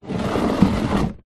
plastic tub box large drag slide smooth concrete ground; foley